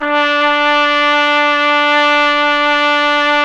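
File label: Solo Brass trumpet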